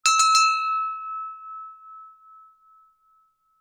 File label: BELL HAND